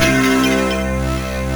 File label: WAVEMERGE, Electro Perc; E-MU Formula 4000 Series Vol. 3; Analog Odyssey